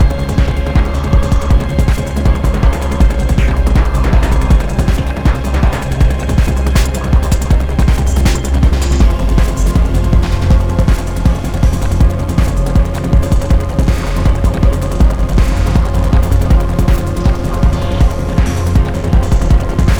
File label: Waterworks Murky Fast; rive wreck hack die retry; ps4